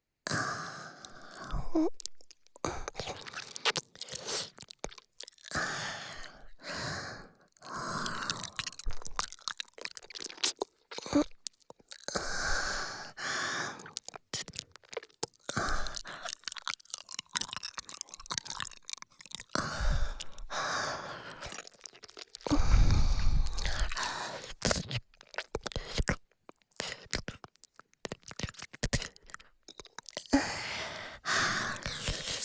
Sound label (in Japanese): 耳舐め（右）舔耳